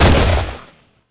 pistol shoot; Pirates Of The Caribbean; DUEL

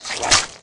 Spit, Valleakan Mutant; Valleakan Mutant 2; Aliens

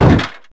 door sliding locked; Max Payne

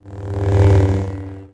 lightsaber swing slow; weapons

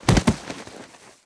bodyfall grass, foley

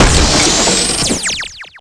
hit video game; hits; Tony Hawk's Project 8; XBOX

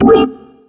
Objective Complete

